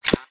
Inventor Broombot Hop, creatures mechanical

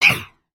VO Walk Run; creatures organic

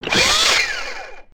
leg servo, creatures mechanical

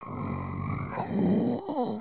VO sleep, creatures organic

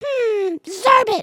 excited, emotes

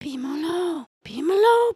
dice roll; emotes

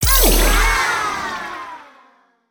Celebrate; foley

